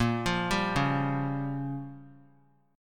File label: Bb Minor 6th, guitar; arpeggiated; JGuitar